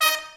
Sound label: SECT BRASS FX